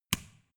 switch small turn off

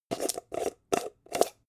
jar plastic midsize open long

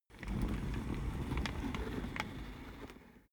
office chair worn trundle long

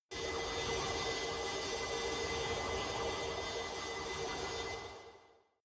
space alien fabric working sawing

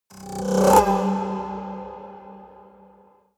haunted strings roll high

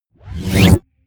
sweep

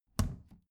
plastic trash can close regular muted

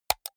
computer mouse right click hard

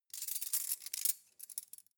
cutlery cake forks handle

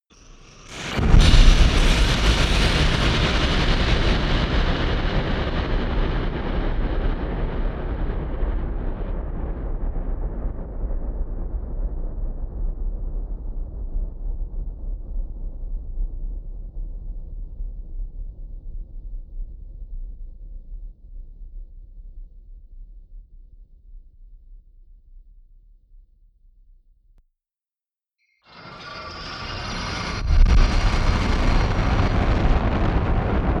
eerie burst large

